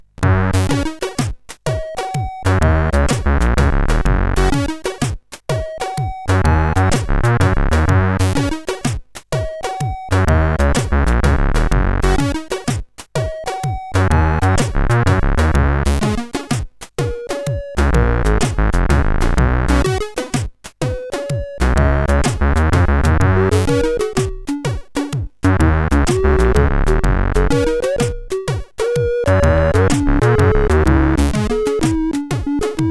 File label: Reggae, sid CSG8580R5